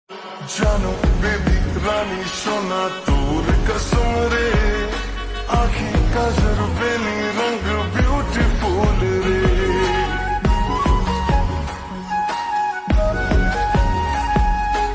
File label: Slow Reverb Version Ringtone